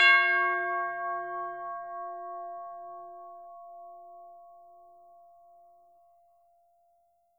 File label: tubular bells hit C#5; AO bell